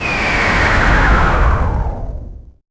high speed flight sound p, acceleration

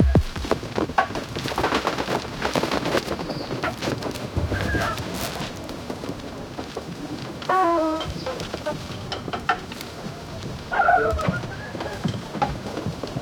eastern arabian souk wood; marketplace

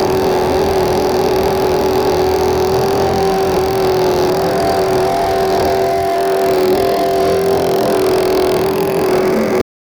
an mechanism for moving

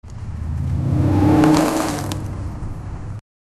parkour climb

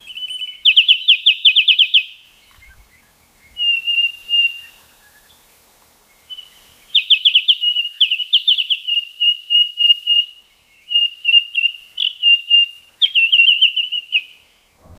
bird chirping luxury midd

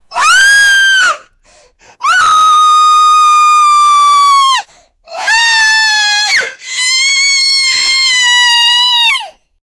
an old 75 year old, screaming

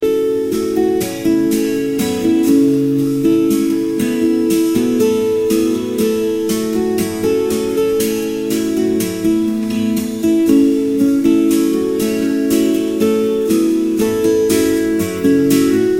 looping sound of a guitar; cinematic

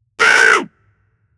sound of an zebra for; animal call

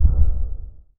a soft magical whoosh sound, non-aggressive

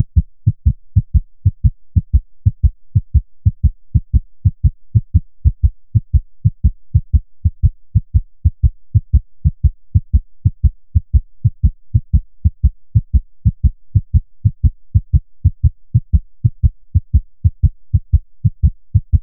i need a heart beat, anxious

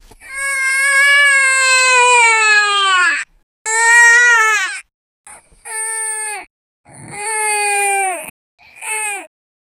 puppy crying sound

